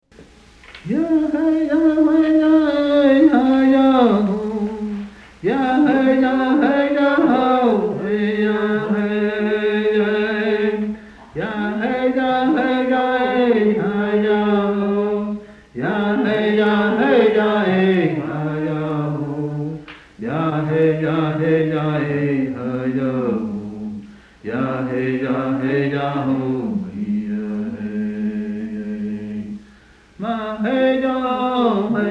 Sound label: hymnbook songs, cheyenne language; Ma'heo'o Naveseneshevatama